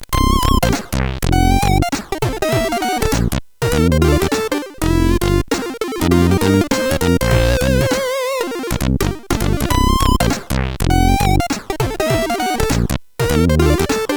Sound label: Little Jazz Cafe, CSG8580R5; hvsc